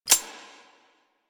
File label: Sticky Bomb Pin Pull; Grineer; Veilbreaker; Vor